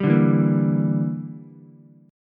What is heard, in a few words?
A sharp 6th Flat 5th, Ukulele; A#6b5 strummed; JGuitar